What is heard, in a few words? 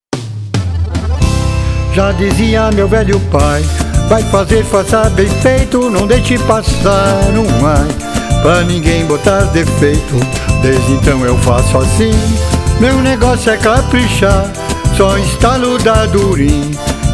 jingle vanera